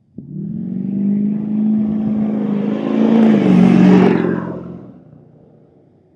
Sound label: lml straight flyby